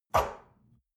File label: espresso grab from table hard, restaurant cafe